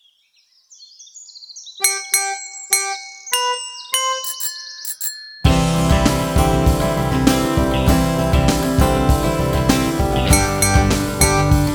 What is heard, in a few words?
Instrumental